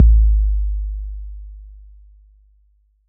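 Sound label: bass drum 808 Decay E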